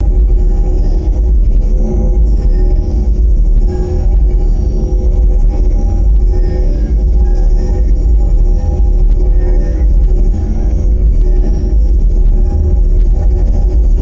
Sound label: deep rhythmic sci fi energy throb